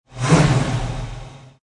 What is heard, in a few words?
Mustang 68 Fastback Rev Engine Perspective, Car; Cars; Vehicle